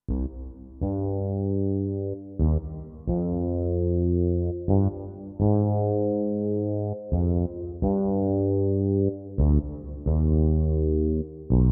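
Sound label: Flange Bass, digital piano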